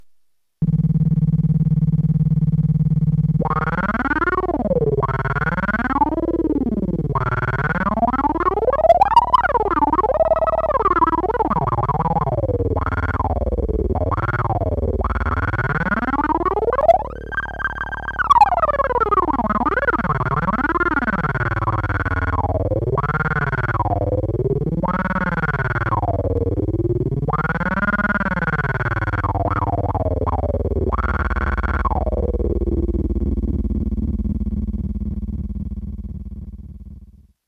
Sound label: twang; Scott Stites